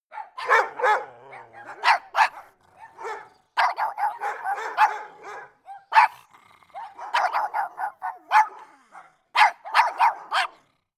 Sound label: dogs neighborhood bark close